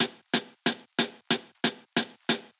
Hihat 92 bpm; Loops; Drums; Top; Monarch; Ableton Live 12 Lite; Core Library

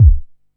Kick Smooth; One Shots; Drums; Punt

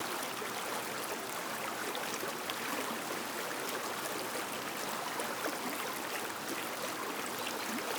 water splash loop; background

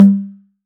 Tom; Ableton Live 12 Lite; Core Library; Drums

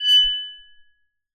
Arco Metal Attack Medium F#5; Multisamples; Sound Objects; Ableton; Live 12 Lite; Core Library

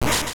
Met Wave; MMBNMMOP

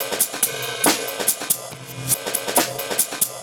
Tops Squashed Overheads 70 bpm, Drums; Loops; Ableton Live 12 Lite; Core Library

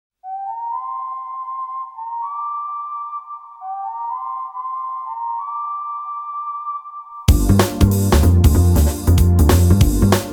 KICK ABOUT Jazz version

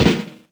Snare Roll, drums

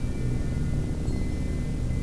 warpgate; Active Worlds

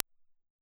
Focusrite ISA, Reverb; FL Studio 9; Fruity; Edison

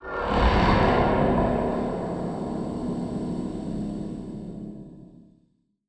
Mountain Guardian New Attack Vox DISTANT; Journey

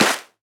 Clap Hit Hard; One Shots; Drums; Ableton Live 12 Lite; Core Library

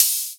Hihat Open Low Machine

